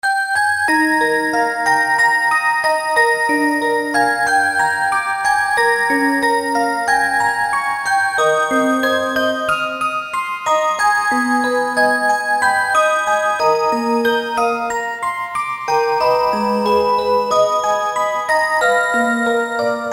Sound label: rhythm song, clocks